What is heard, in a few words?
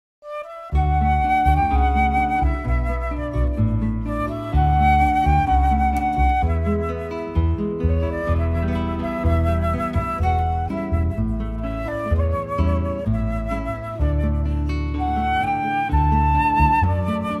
guitar flute bass trio